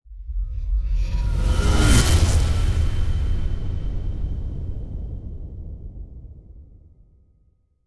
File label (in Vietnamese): Am thanh gay soc bat ngo